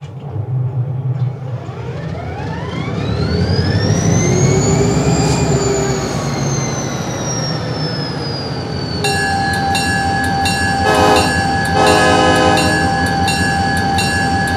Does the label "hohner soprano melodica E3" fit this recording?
No